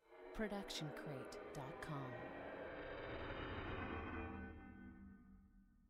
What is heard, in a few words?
horror airy rumblings; soundscrate